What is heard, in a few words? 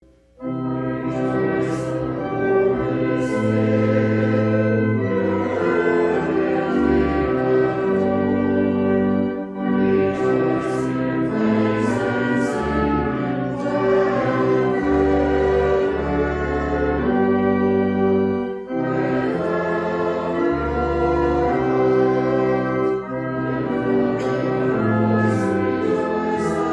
trumpet